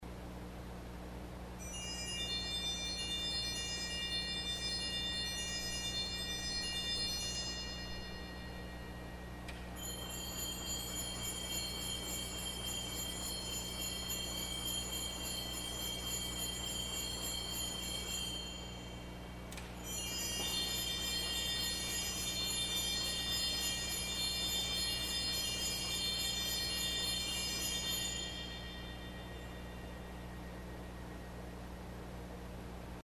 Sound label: Zimbelsterns 1 and 2, organ stops; 1 then 2 then both; Fabry 1982-85; CulverAcademy